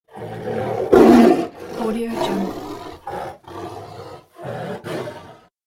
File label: tiger roar